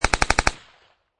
Machine Gun Thompson Machine Gun Short Burst; Machine Gun Sound Effects

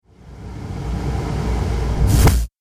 Trailer Hit Descending Splat

